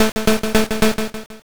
chew

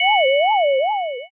flashback in

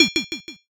plate set

